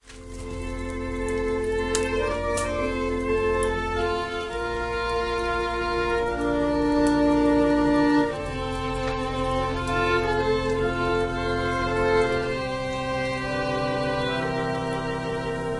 Organ and violin prelude

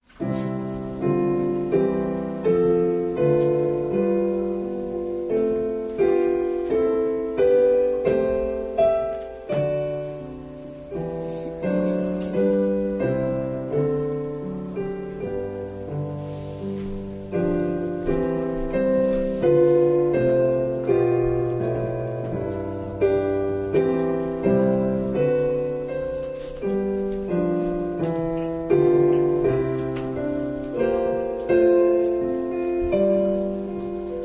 Piano prelude

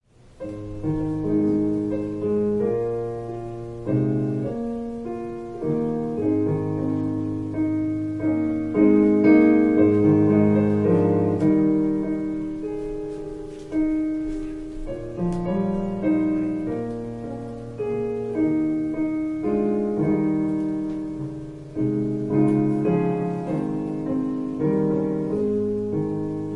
Piano prelude

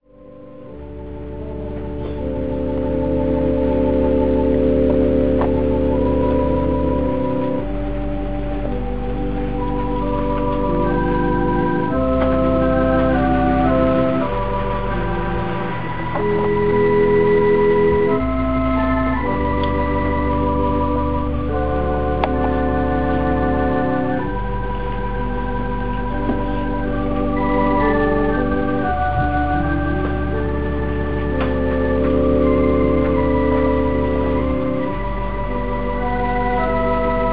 Organ prelude

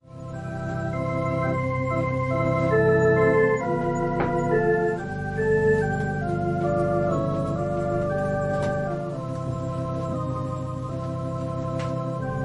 Organ prelude

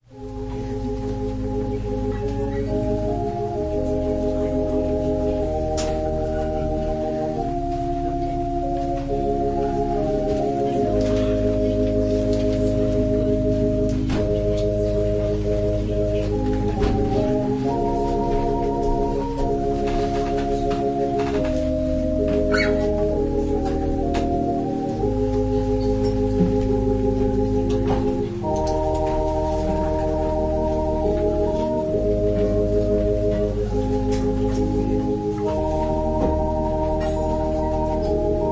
Organ prelude

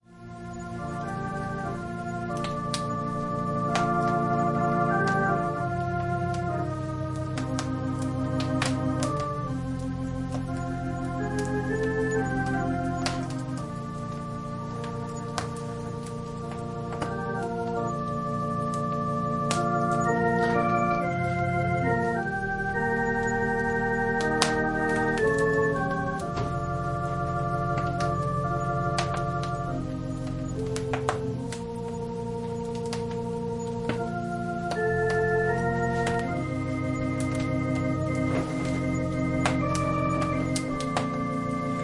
Organ and violin prelude

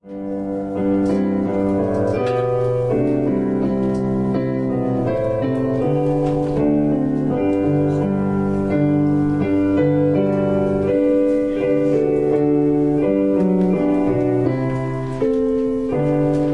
Piano prelude

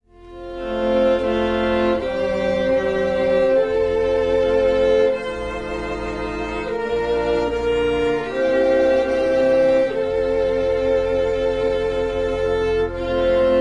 Organ, cello, and violin prelude

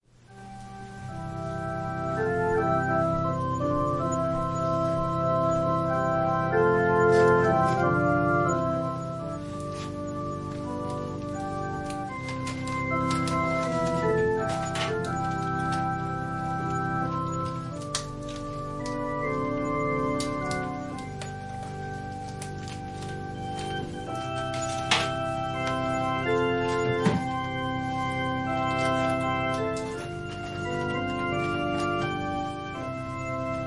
Organ prelude

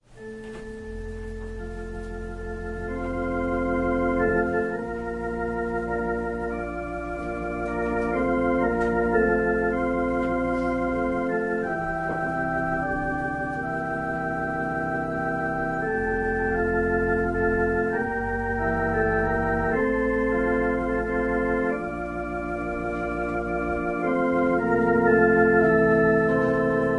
Organ prelude

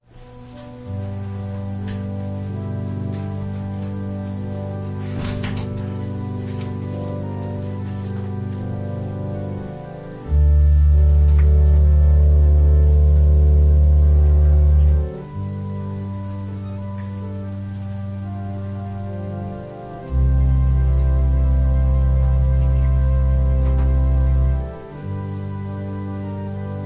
Piano prelude; Follow Me

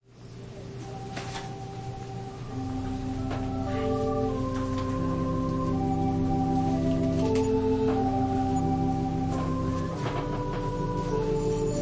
Organ prelude

